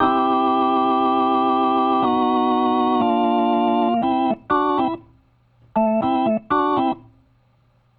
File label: Organ, 70s Analog Funk; Sonic Foundry (Sony Creative Software); What it Is! 70s Analog Funk